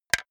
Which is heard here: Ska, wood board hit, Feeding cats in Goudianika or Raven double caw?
wood board hit